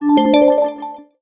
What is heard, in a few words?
twinkle ringtone samsung sms